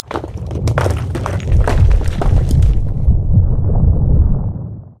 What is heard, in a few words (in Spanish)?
tierra